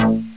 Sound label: tech bass